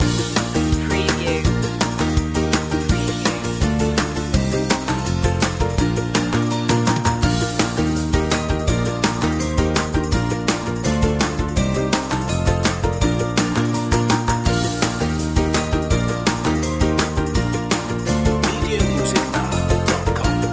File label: kids in the sun loop